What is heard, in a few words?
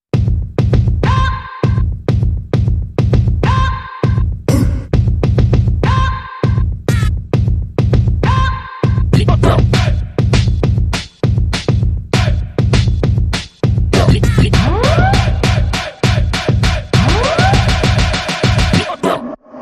no lead vocal